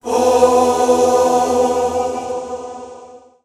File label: divine light, battle